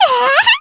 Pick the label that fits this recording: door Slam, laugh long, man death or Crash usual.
laugh long